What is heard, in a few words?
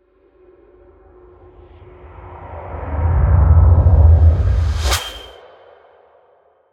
cinematic transition